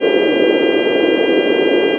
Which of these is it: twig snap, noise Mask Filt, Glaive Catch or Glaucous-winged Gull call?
noise Mask Filt